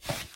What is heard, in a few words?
Skip Walk; lethal company